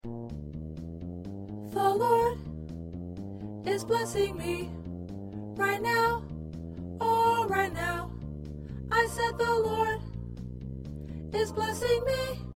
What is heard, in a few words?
Alto